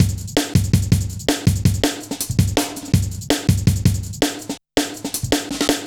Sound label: Heavy Live B, Drum Loops, Jungle; Zero-G Jungle Warfare 1, 2 & 3